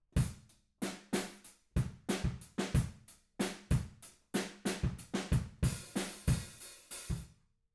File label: Room